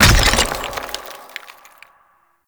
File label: Stone Pillar Destroy; Duviri Murmur